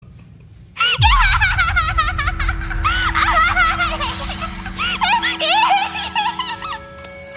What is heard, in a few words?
laughing; mirai nikki; yuno